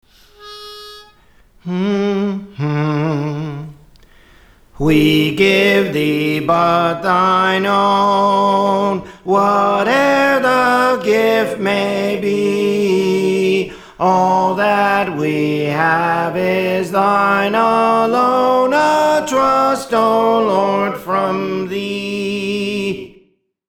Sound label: Soprano; Praise For The Lord Training